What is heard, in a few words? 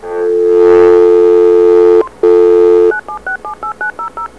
Dial; Farallon; House